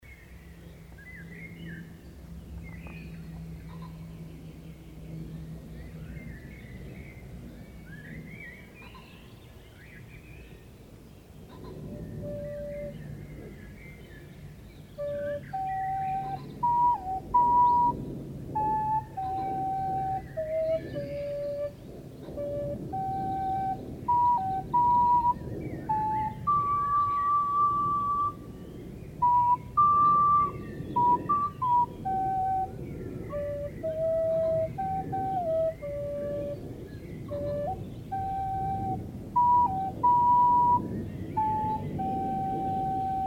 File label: Recorder Break